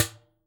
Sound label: Dustbin, IR mono; Claustrofobia v1.1; Dustbin 3